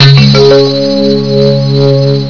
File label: magic